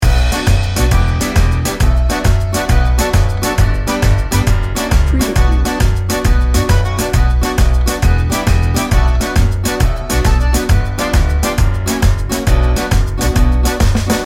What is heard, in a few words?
tarantella frenzy loop